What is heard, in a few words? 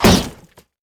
wolfman vs wood; LoL; warwick